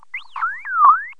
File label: cutise